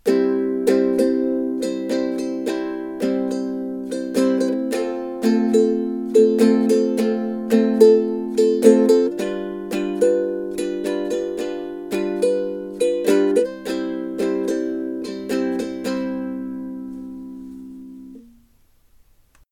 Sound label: calypso, ukulele